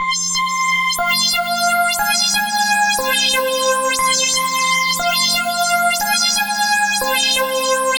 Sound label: Pulsar Synth, Synth Textures; Sonic Foundry (Sony Creative Software); The Electronic Music Manuscript Collection